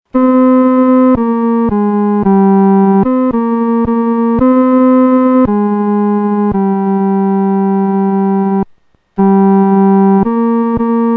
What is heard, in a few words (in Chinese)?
独奏 第三声; My Faith Looks Up to Thee; Ray Palmer